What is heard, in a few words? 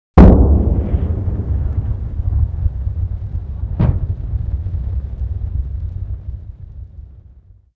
Artillery; Red Orchestra 41-45